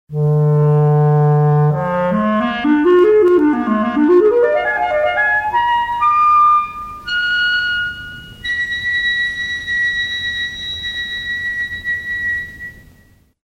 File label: clarinet